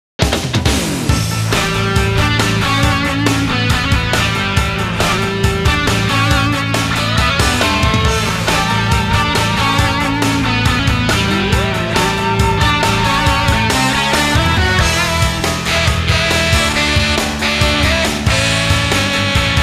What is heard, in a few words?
Music event